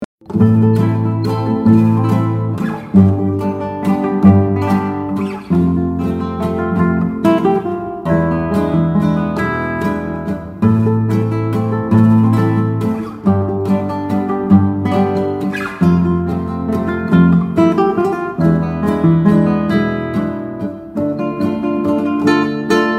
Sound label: guitar clean